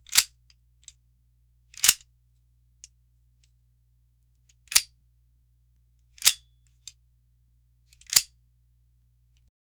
pulling the gun from the, holster

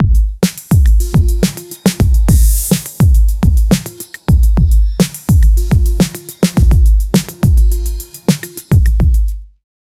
lifestyle montage chill

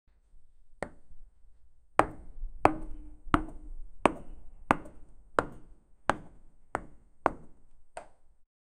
block high heeled pumps w

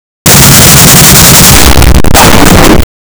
close up recording of a p, machine gun

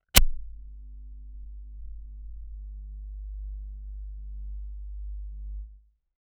machine

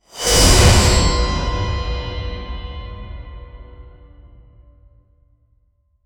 a chilling sharp string

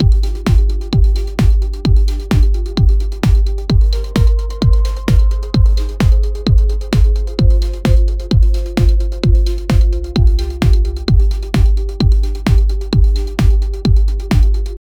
minimal techno rhythmic; game music

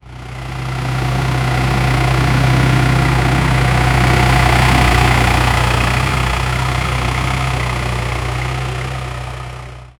tractor, rural

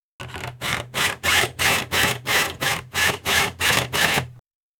saw cutting wood, woodworking

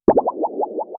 bubble shooting sound; bubble bobble

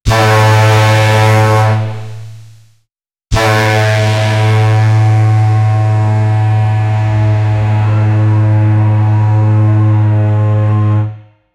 a goal horn

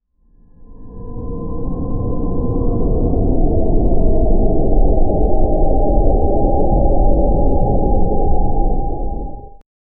sound effect aura energi

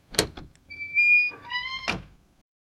generate a soft wooden, aged

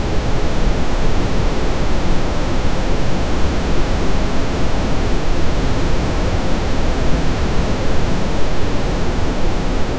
mars storms layered pink, pink noise

